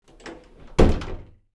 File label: door close